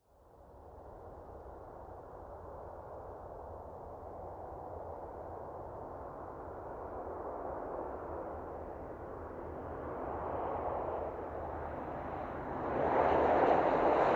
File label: Light Aircraft approaching then taking off